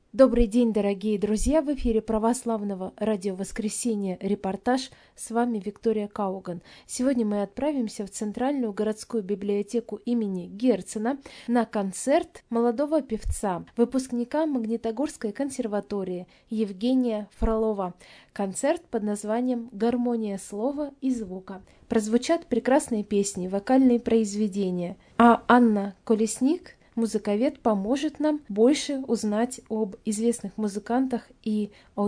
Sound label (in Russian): muzykalnyj koncert; reportazh; garmoniya slova i zvuka